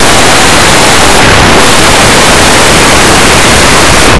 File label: frog; Blue Heat